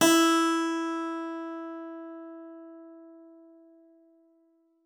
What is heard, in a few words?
SPIN D#4, SPINET; Xsample VOL-7